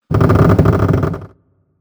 扉を開ける; モノラル; ＲＰＧゲームのダンジョンやフィールド

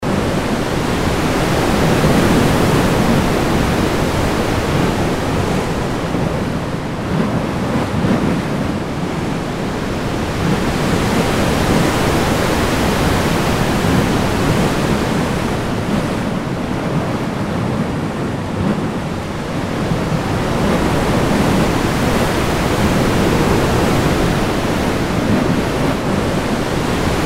海の音

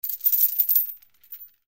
鎖の音; dungeon

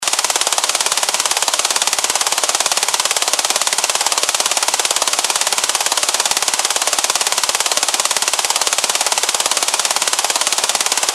フィルムの音 映写機 フィルムのみ; 「演劇・舞台」の効果音

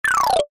場面切り替え 木琴 下り 速め

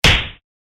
打撃; 武器効果音

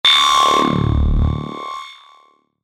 機械起動; モノラル

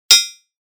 スピア モノ; 武器効果音

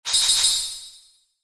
ランク レア度3

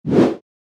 剣を振る; 武器効果音

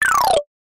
場面切り替え 木琴 下り 速め; 場面切り替えや心理描写; 演出効果音

